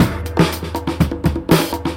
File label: bamp buh bamp, Drum loops, Funky